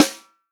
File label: snare drum, POP SNARE CHROMATIC; Best Service ProSamples vol.43 - Real Drum Kits; REAL DRUMS